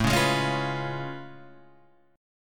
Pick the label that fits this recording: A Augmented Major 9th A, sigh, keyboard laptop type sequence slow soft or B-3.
A Augmented Major 9th A